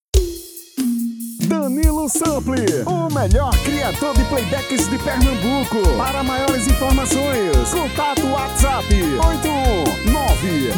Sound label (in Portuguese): Vers Brega tom feminino